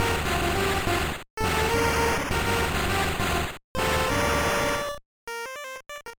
Jingle

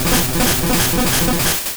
Bonemerang; sfx; attack moves; pokemon; gen 1; rby

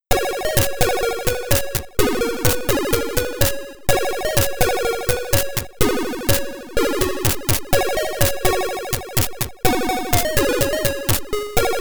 London Bridge is Falling Down, Atari ST; lemmings

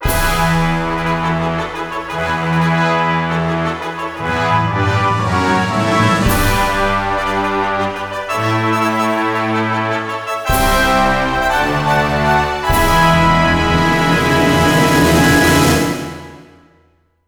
Fanfare!; PokePark Pikachu's Adventure; Wii

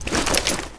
ui equip Armour

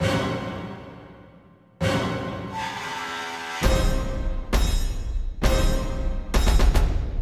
Boss START; Bolt

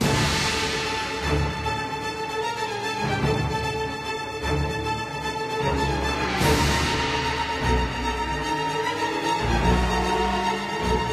sting; The LEGO Ninjago Movie Video Game; PS4; Windows; Xbox One

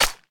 Disc Rico Stone; Boomerang Fu

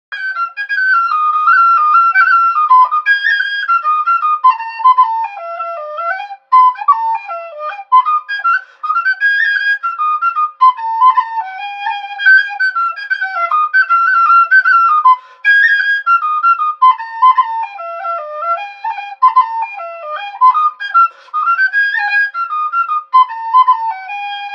Wind Instrument; Assassin's Creed Syndicate

